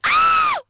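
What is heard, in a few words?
female scream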